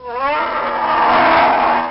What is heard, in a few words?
brown die; DAoC; Toa